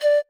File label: marimba child; ゆめ2っき